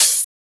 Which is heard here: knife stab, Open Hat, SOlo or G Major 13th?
Open Hat